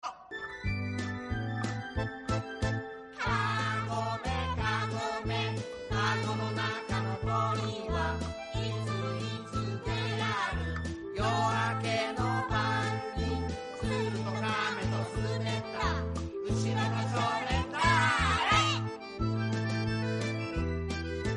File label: Kagome Kagome song